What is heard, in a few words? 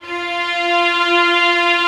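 Vio legato F3; Digital Sound Factory Virtuoso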